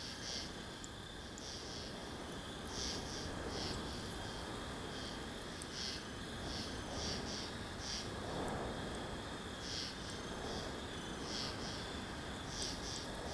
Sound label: ambience coniferous forest night breeze dry; Rust